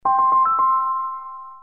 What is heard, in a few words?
Jingle; T Mobile